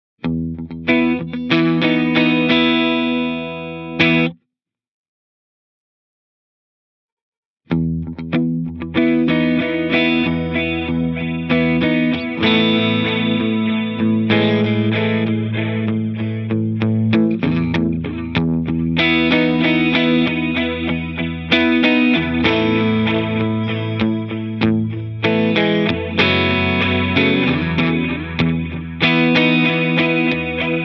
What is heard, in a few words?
Analog Deluxe Memory Boy